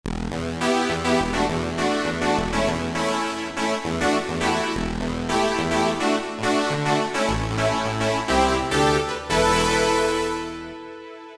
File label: Poly Synth, Roland SR-JV80-01 Pop